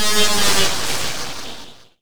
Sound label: SCI-FI FX; Best Service; XXL Production Effects; Sample CDs